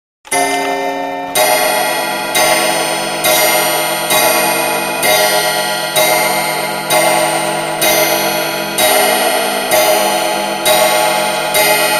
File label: Wall Clock, Strikes 12 O'clock, Brass 'coil' Bell, Light Internal Whirring, Including Quaterly Hour Bell At Front; Czech Bozak Wall Clock, Dated 1830, Strikes 12 O'clock